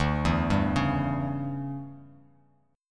D Major 7th Suspended 2nd Suspended 4th, Bass Standard; arpeggiated